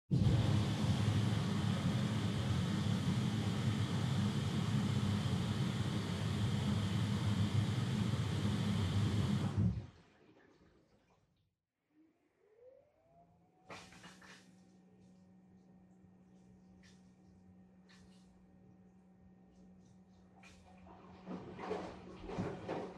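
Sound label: Washer Front Load Operation Noise Far Pour Water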